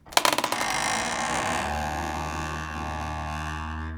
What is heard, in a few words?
scary creak; svencoop; zombie nights